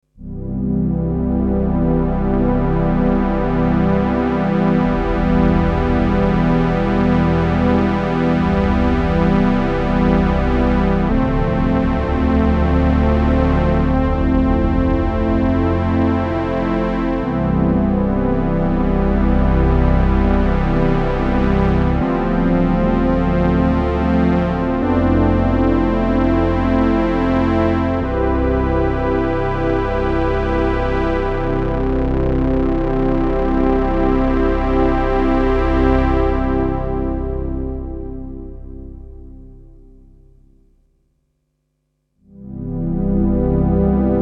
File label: OB pad